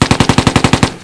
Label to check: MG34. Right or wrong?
Right